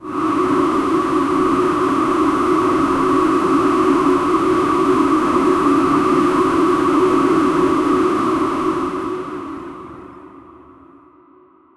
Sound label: space whoosh vacuum atmos